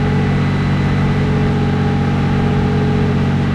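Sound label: centurion engine interior rpm 66 load, tanks; War Thunder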